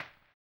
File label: HAMBONE, Vocal Blues Soul